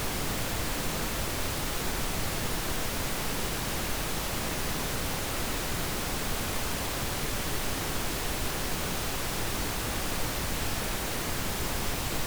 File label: pn full fr -20dBFS -23dBrms No LFE 7 1